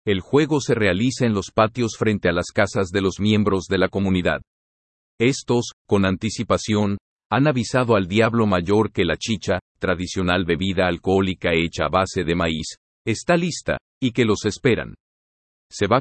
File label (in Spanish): Procesion; Autoguía; Español; Memoria Brunca